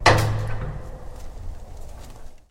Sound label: door open; Звук открытия дверей; ProjectZ